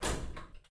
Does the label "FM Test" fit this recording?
No